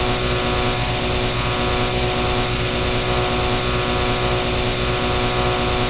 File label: power room machinery